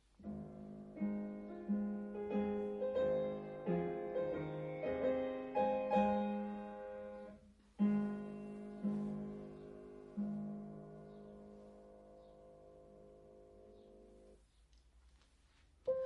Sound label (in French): ténor; stabat mater; Grandval n4